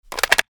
ak47 reload empty mag bump; Tacz1.1.5+; RSWS 1903

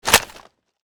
ak47 reload raise shoulder; Tacz1.1.5+; RSWS 1903

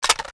ak47 inspect raise grab; Tacz1.1.5+; RSWS 1903

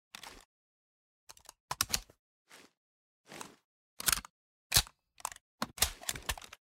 uzi inspect empty; Tacz1.1.5+; RSWS 1903

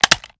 sks reload fast magout; Tacz1.1.5+; RSWS 1903

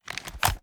sks raise; Tacz1.1.5+; RSWS 1903

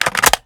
sks reload mag in; Tacz1.1.5+; RSWS 1903

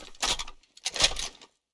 g2 reload; Tacz1.1.5+; RSWS 1903

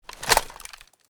ak47 inspect over; Tacz1.1.5+; RSWS 1903

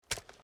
ak47 reload; Tacz1.1.5+; RSWS 1903

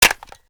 ak47 reload empty mag out; Tacz1.1.5+; RSWS 1903

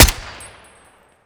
ak47 silence; Tacz1.1.5+; RSWS 1903

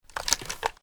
ak47 inspect turn; Tacz1.1.5+; RSWS 1903

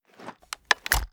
sks reload end; Tacz1.1.5+; RSWS 1903

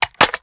sks reload mag out; Tacz1.1.5+; RSWS 1903

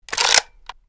ak47 inspect pull; Tacz1.1.5+; RSWS 1903

